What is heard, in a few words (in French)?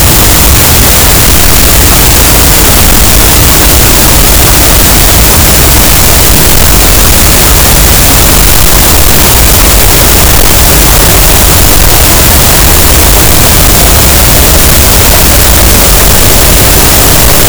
cachalot, gaussian noise; Physeter Macrocephalus